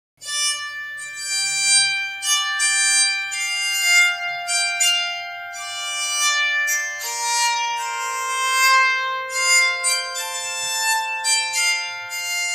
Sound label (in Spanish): Instrumentos musicales. salterio zanfona